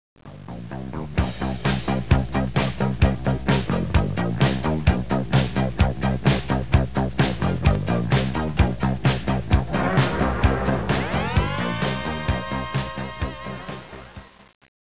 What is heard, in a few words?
hi tempo